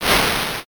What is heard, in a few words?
swoosh